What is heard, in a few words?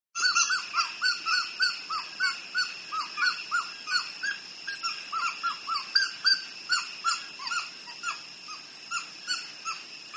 Fruit Bats Squeaking